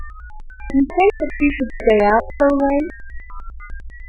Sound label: noise out